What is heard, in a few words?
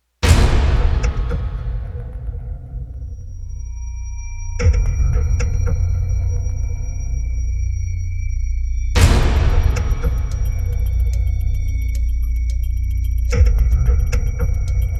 percussion only